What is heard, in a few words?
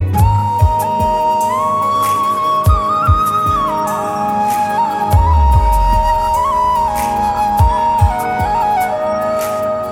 flute tone; tamil ringtones; achcham yenbadhu madamaiyada